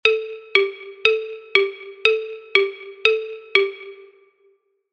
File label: xylophone